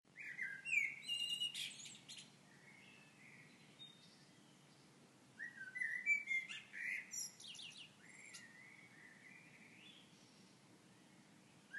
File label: birds; Debuitenbeleving